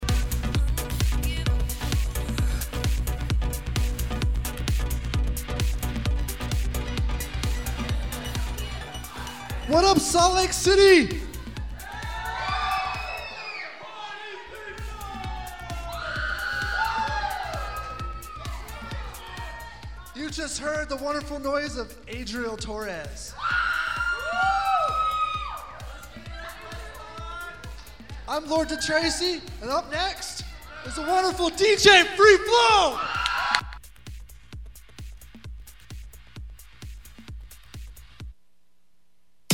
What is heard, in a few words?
Electro Thursday